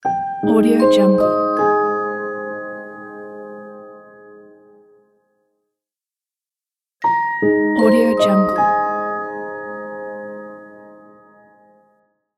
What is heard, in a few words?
piano simple logo